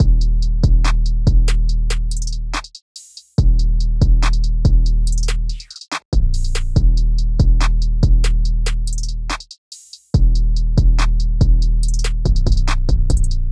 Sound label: Type Beat Drums